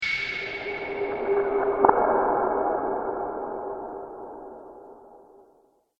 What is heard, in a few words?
Dungeon Atmospheric, scary soundscape